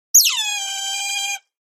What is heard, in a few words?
big squeak